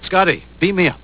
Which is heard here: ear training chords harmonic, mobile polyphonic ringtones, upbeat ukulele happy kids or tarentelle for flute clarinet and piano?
mobile polyphonic ringtones